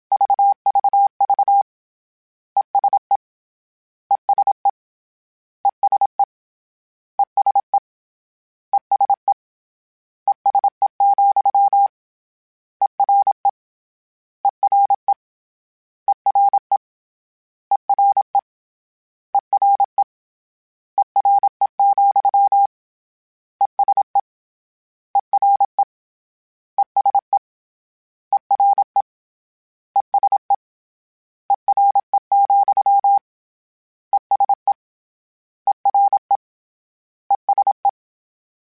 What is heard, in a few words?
CW Code Groups Suffixes, ESE ERE